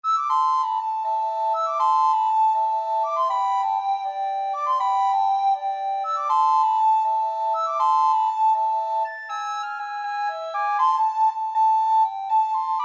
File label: recorder duet